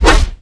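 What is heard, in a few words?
Trumpy Attack; SpadeTrumpy; Seer; GamigoNA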